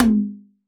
TR-707 Tom 6, Roland BK-5 Toms

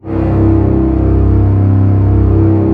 orchestra C0 PP; Sound On Sound - Peter Siedleczek's Orchestra

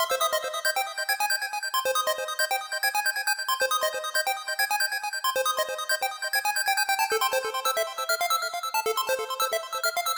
Bleep Lead, Club Techno, 137 BPM; Zero-G; Construction Kits

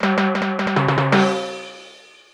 Timbales Play, Yamaha DD-35

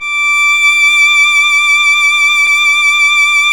STR VL D 7, STRINGS; Roland - LA Composer vol. 1

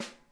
snare, drum kits; Sonic Reality; Interactive Drum Kits

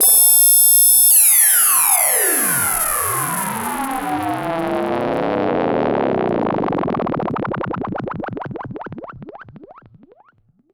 FX, EMS VCS3

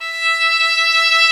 STR XVLN, STR Quartet, Strings x4; Club-50 - Foundations Roland

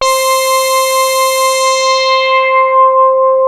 LEADSWEEP, BASS STATION; Syntec; Wall of Sounds

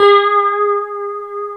A 4 STRAT MF, HYPED STRAT, Guitars; A 4 HSTRT MF; Spectrasonics; Hans Zimmer Guitars VOL-1; 90's Sample CDs

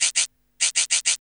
Burning Grunge Hip Hop, RNB 100; USB Soundscan vol.34; AKAI

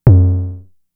FX, Drum Machines; Fricke Schlagzwerg

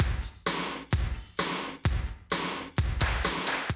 130, Classic DrumLoops

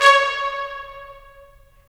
BRS, Tps Stacc; Roland - LA Composer vol. 4

